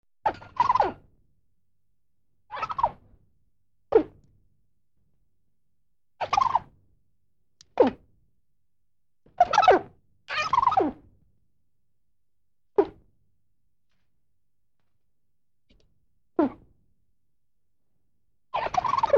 Miscellaneous bird chirping and hooting

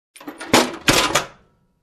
Closing compartment

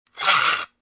Loosening a Screw

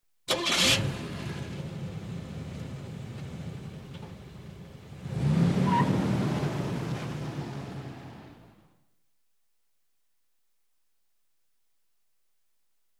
Car Engine Start and Go

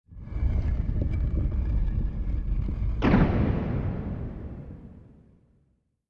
Warehouse Door close

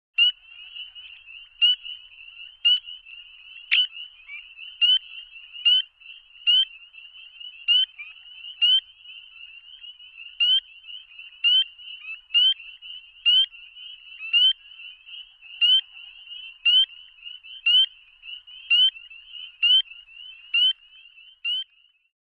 Birds chirping with echo

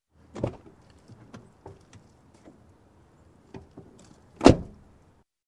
Entering into Car

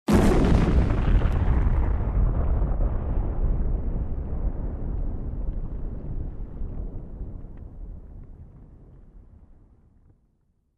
Huge explosion up-close

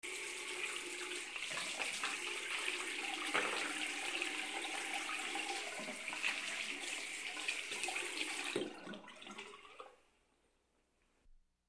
Handwashing on running water

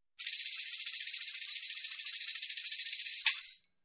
Tambourine Rattle higher

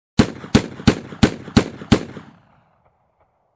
Multiple gun shots with echo

